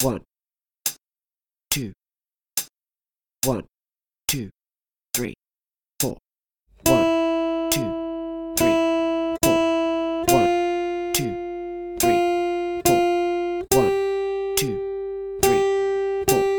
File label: 2 Strings Longs, guitar